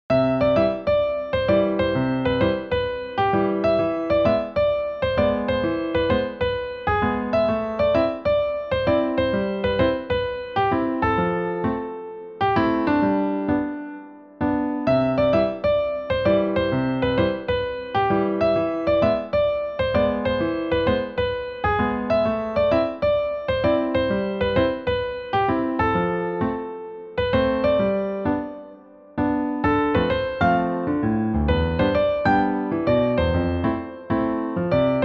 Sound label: Newage; KIDS 귀여운